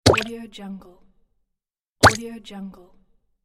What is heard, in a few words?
liquid accent; pilot-audio